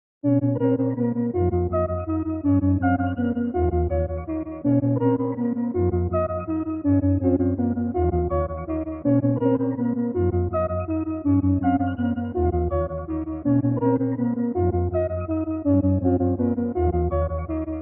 109 BPM A# Min Half Time Melody; Loop Stems; Cymatics; 2022 Melody Collection Platinum Expansion - Pt 1; Finish Line

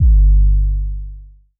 808 - G; Basses; Cymatics; Eternity Sample Pack; 808s

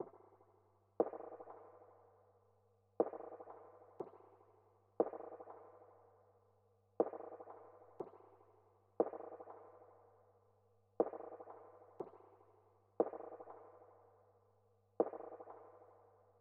120 BPM D# Min Percussion; Loop Stems; Cymatics; 2022 Melody Mystery Expansion; Slowly Drifting

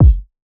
Lofi Kick - C

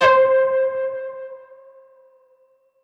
Memorymoog PLUCK Crack - C; Synth; One Shots; Cymatics; Fantasy Synth Sample Pack

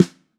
Birch Rim Shot, Snares; Drums; Ghosthack - Free Snares 2023

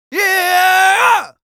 Yells, Viking Vocal

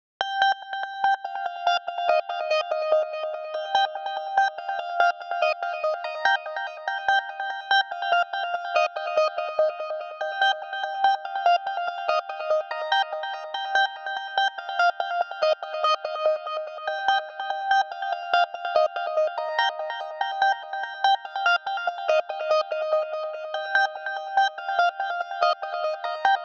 144 BPM C Min Lead, loop stems; Cymatics; Solar Eclipse; 2022 Melody Collection Platinum Expansion

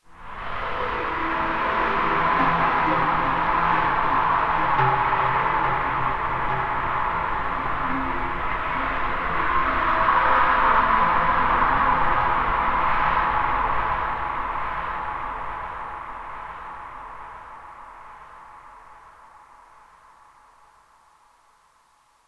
Tonal FX Am7 70 Bpm, Granular Tonal FX, Lo-Fi Kit; HipHop; Ghosthack; Advent Calendar

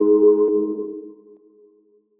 Alarm; Beeps

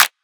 Small Clap; Drums; One Shots; Claps; House

